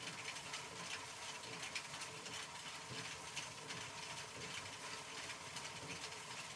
Hub Train Rattle Loop

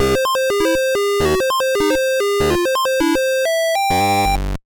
Stage End; Donkey Kong Jr; Atari 7800

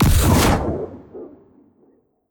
Hellbringer Hit

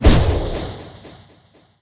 Nail Art Great Slash

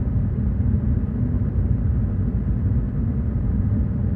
Room Shake Loop; gamerip; Brkn Wand

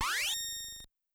Bootup Sound, WonderSwan BIOS

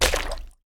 Death

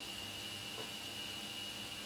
Intro Server Room Light On Loop

